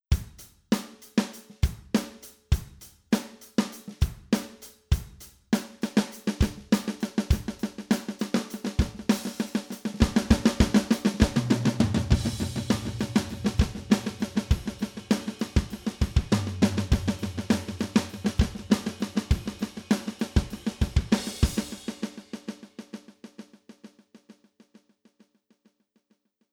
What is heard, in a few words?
DRUM Delay